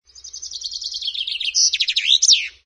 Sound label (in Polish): zięba